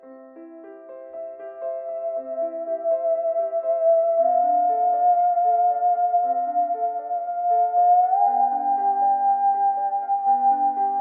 musique, instruments insolites

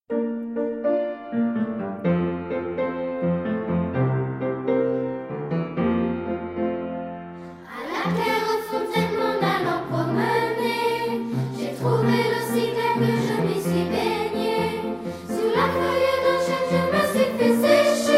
a la claire fontaine creole voix enfants; oiseaux en musique

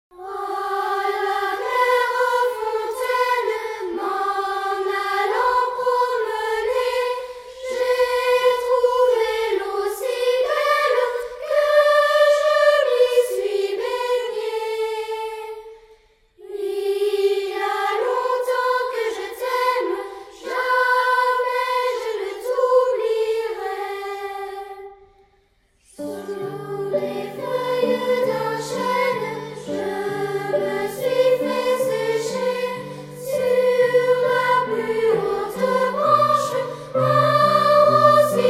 a la claire fontaine 1 voix enfants; oiseaux en musique

